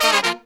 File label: Phantom Horns, 132BPM; Zero-G